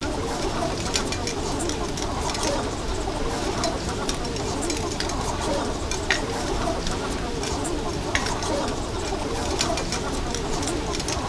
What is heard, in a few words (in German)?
Atmo